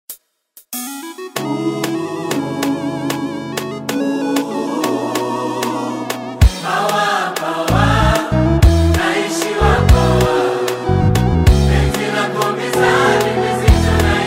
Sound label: choir Version